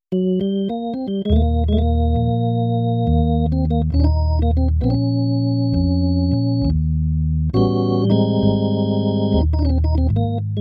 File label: ballad